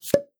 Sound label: pop supon high